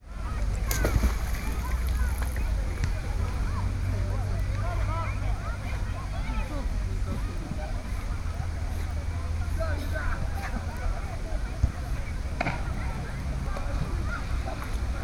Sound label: swimming dog; sergiyev posad